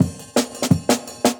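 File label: Jungle Breaks, 170 bpm; 90 s Sample CDs; Original Jungle Breaks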